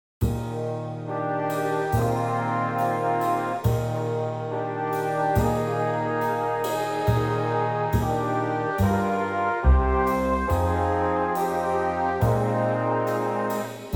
Eb Big Band

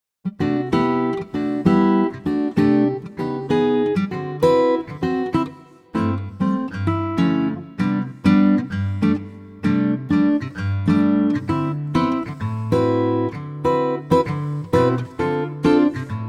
Bb acoustic guitar; key - Bb - vocal range - Eb to F; Lightly swinging acoustic guitar arrangement; bossa

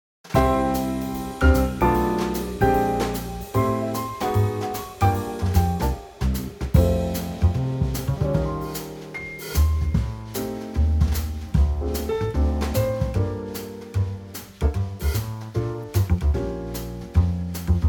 F 150bpm; jazzville

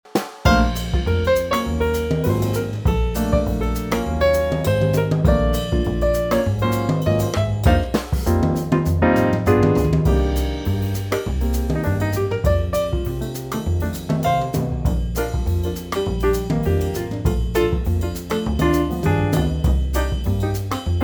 Bb Trio; version 1 latin

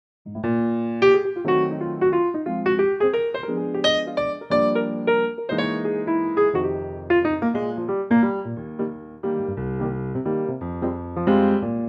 Eb 3/4 piano

